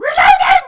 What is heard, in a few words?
reload, weapons; garrysmod; bane